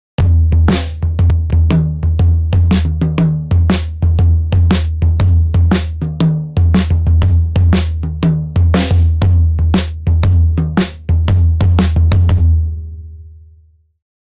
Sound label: Bebop Kit